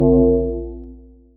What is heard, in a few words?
Bell